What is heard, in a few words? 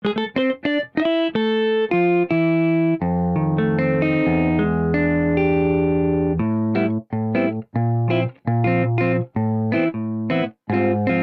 Jazz Guitar - Hard Compression